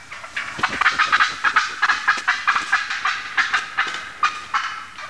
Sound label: fly up cackle, Turkey Sounds